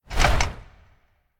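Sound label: small swing; Smite; Cabrakan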